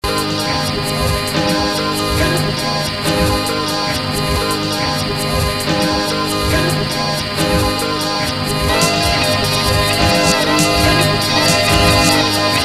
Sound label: instrumental